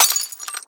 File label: Glass Break; Beat Saber Miss Sounds